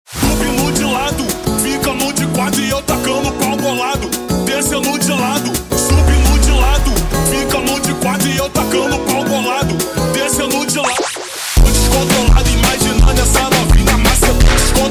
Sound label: Brega Slowed